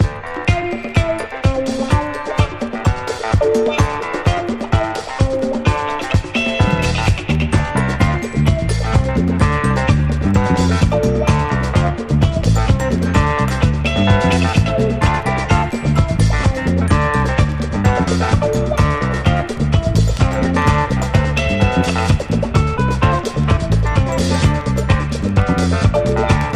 INSTRUMENTAL

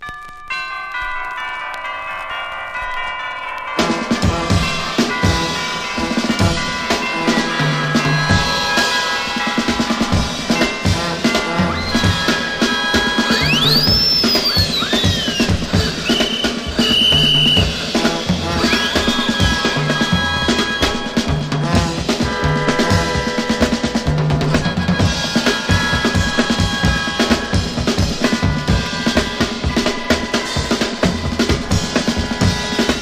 psychedelic percussion

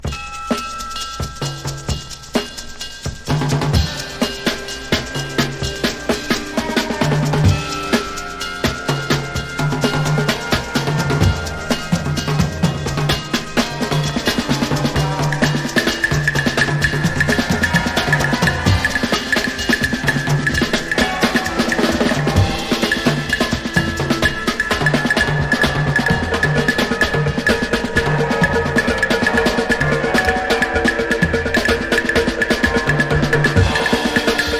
psychedelic percussion

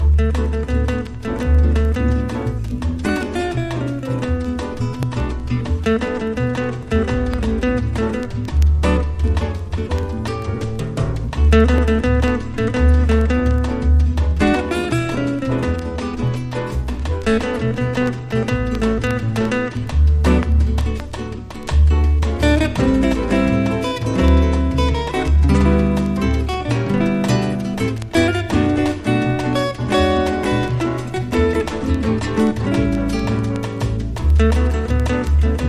samba jazz samba; LOUNGE

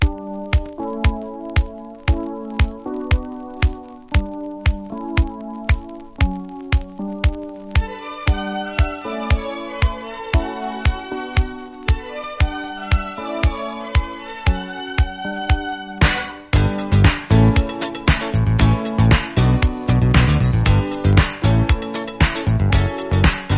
HOLIDAY HIP HOP INSTRUMENTAL

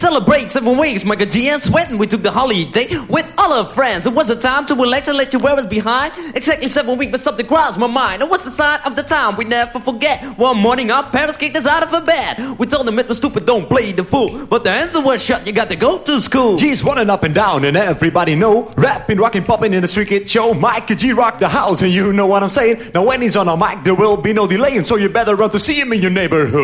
ACAPPELLA

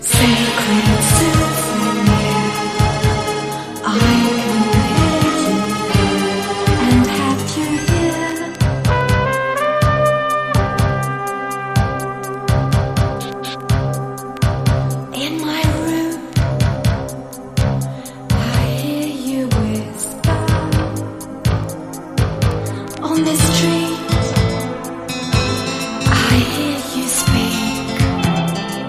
whistle wind

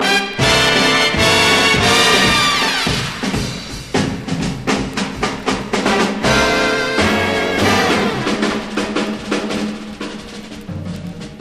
wild stereo drums; SAMPLING SOURCE

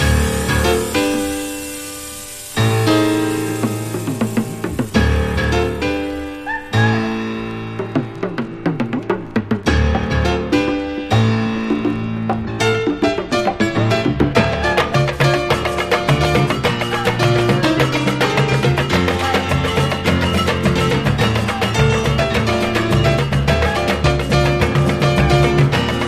BATUCADA; COSMIC / BALEARIC

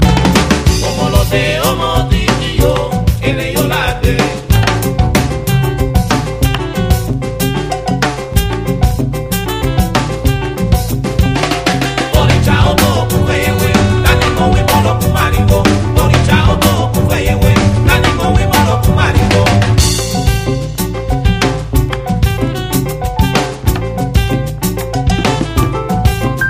COMBO PIANO

